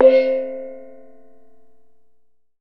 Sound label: C1 HARD, Kye Waing